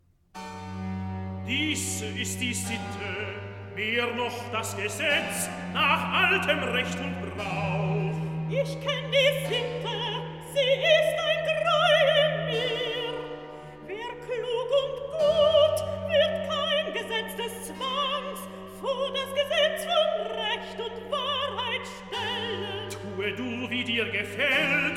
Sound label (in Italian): Recitativo; Belsazar; Dies ist die Sitte; Handel Masterworks; Klassika